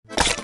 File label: funny splash